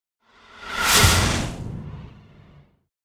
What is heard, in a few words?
Whoosh Fast Air Fatal Strike; Hollywood Edge; sound designer's toolkit v2